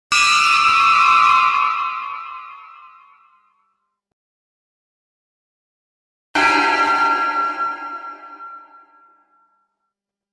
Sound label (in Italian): classici suoni spaventosi effetti sonori horror sounds